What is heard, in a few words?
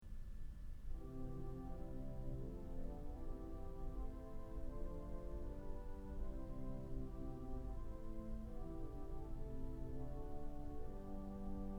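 Vox Angelica, organ stops; Kellogg Auditorium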